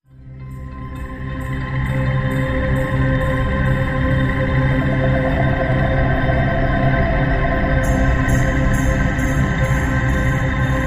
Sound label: Nymph Nature Atmo